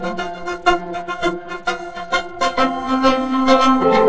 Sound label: violin sound